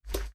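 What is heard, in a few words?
draw, grenade launcher; Dystopia; weapons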